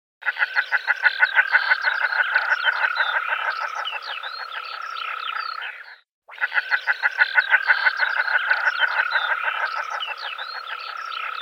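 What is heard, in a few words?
peganka golos, common shelduck voice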